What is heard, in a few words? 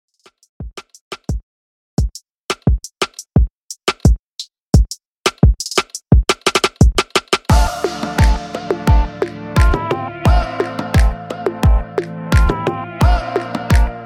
dancehall 87 bpm